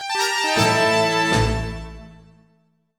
Music Sting

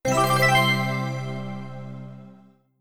Goal Complete; American Idol; iOS gamerip 2009

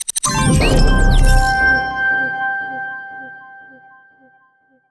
UI Objective Completed; Serious Sam 4

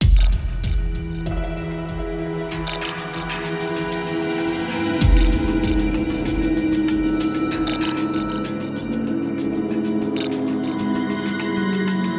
Stealth Loop

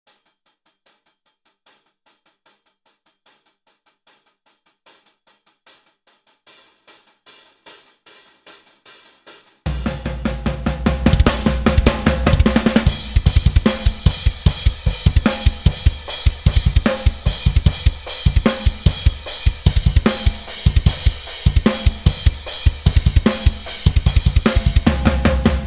Drums I

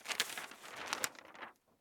Page Turn; the 39 steps

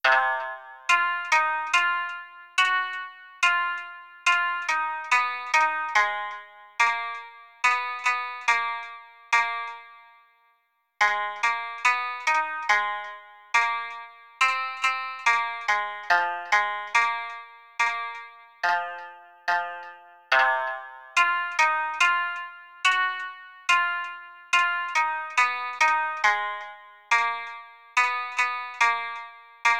Movie BGM, Shamisen; Mario Artist Talent Studio

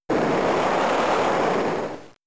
Muddy Water; Pokemon; Gen 3; attack moves; RSE

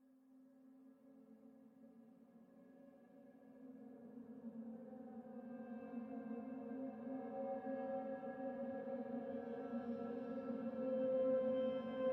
Exploration Night Synth; Horizon Zero Dawn; PS4

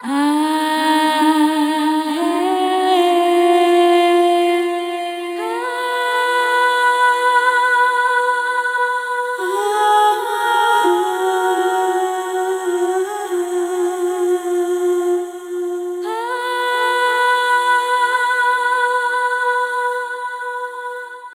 Battle Taiko Theme; Mitra

